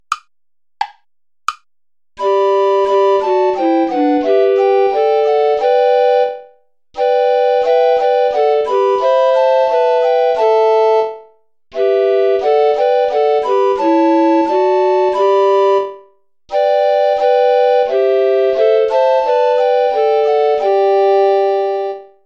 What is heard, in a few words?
play along without bass recorder; goudimel claude; sus serviteurs seigneur